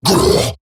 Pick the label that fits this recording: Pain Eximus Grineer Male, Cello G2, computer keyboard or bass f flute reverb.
Pain Eximus Grineer Male